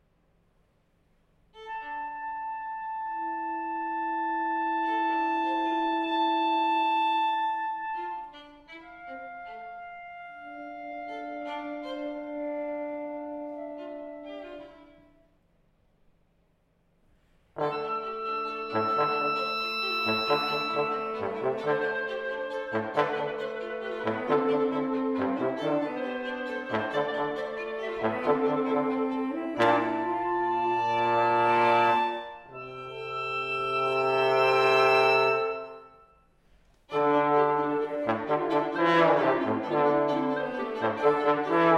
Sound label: Bells; Holland Bells